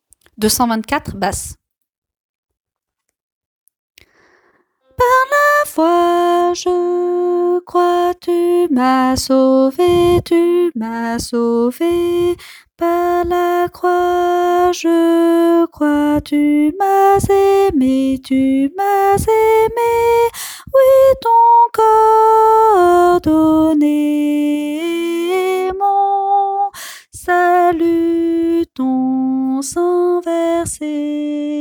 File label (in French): basse